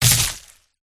mag buff in; zarlog

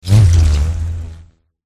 boss attack voice up; zigred

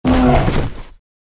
Tiger Die

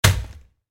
attack contact

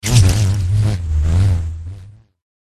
boss attack voice middle; zigred

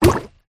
hydra block

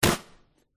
attack contact bottom

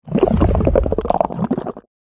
piggy; pet

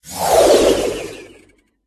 white bear

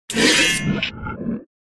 necromant block

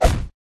block; zermerh

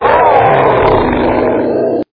gnool death

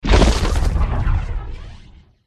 defender buff in

